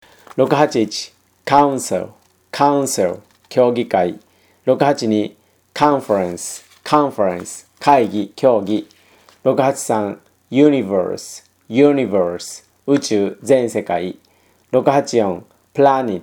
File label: tango